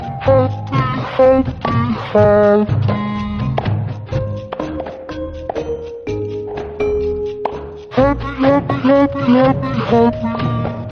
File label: happy happy slowed